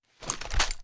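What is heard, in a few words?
assault rifle raise, weapons; viper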